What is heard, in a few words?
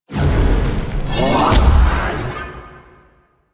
Rising Blades Cast; Enemies; Warframe; Warframes; Pax Duviricus